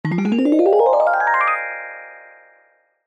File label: cartoon rise climb ascend magical discordant